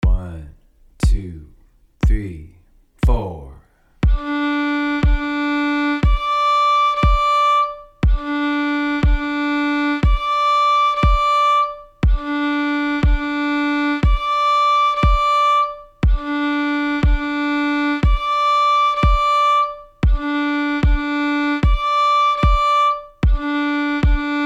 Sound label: Intervals, fiddle; joy to the world